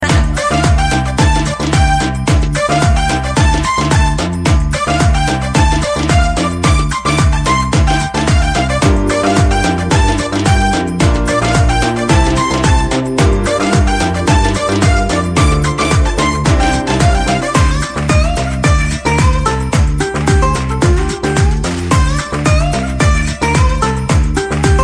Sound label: Simple Music